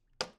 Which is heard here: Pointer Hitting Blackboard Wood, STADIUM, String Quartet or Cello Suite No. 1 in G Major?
Pointer Hitting Blackboard Wood